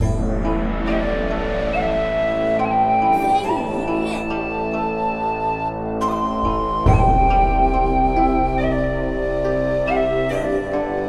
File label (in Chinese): 京城